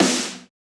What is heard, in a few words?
snare samples, Rock